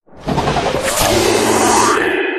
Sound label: win; Recode; rampage riches; bonus chronos